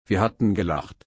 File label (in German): lachen